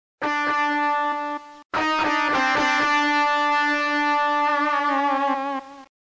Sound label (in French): distor